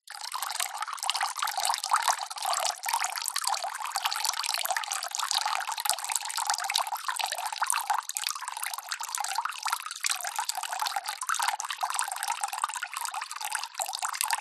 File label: nature water trickle drip